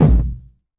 floppy bounce kick; Kicks; single hits

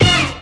cheezo disco hit; Punches Hits Discoblasts; single hits

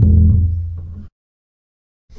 Upright Bass Notes

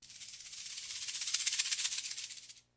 classic hip hop Rat; STYLE KITS (Hip Hop, Etc); Classic Hip Hop Mega Kit

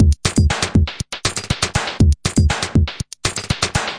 clicky 32nd noter, drum loops and breaks

